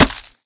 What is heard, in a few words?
Primal Hit